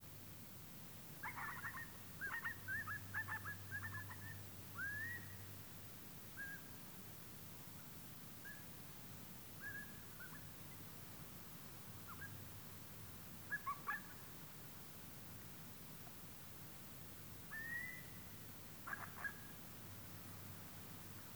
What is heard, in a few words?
chatter